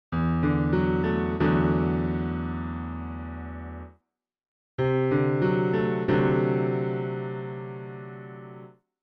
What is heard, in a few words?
Slash Chords, Bass; Bass Unlimited